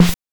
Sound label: BANK1 SD, NES DPCM; MIDINES